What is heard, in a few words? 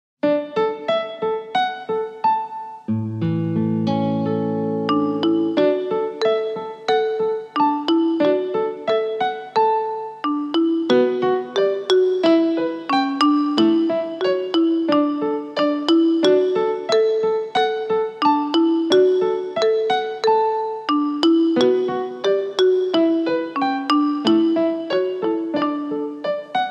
instrumental